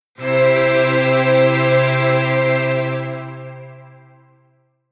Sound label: Strings